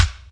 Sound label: bounce; blackshades